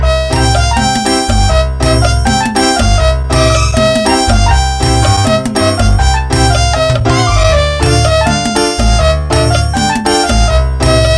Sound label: Nokia Arabic Ringtone; ringtones